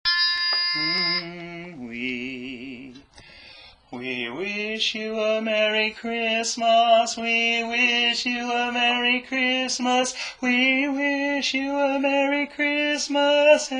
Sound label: Tenor; We Wish You A Merry Christmas